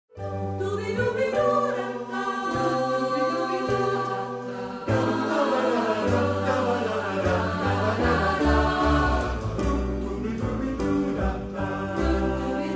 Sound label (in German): Menuett, Jazzchor